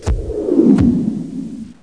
whoo; STRAT1; mono; Stanley's Sticker Stories; Edmark

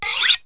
KNIFE, KITCHEN; HOUSE

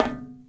metal; Shadow Man

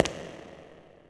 marble; Shadowman; ambient

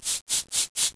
shaker; Wired for Sound Pro CD; Aristosoft, Inc.

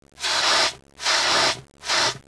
phfft, disaster fire

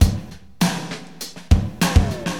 DM LOOP, HipHop, Drums

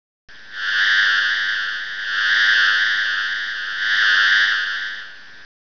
buzzy

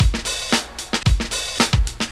GROOVIN

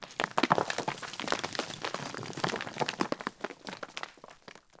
rock; DAoC

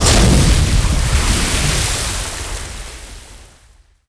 water splash

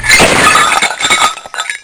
Dropped Bag of Metal

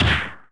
trounce; fight

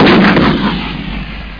artillery fire

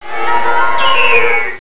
Transporter; Giza 2.0

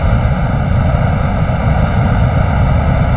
big cannon thrusters; Earth and Beyond; EA Games

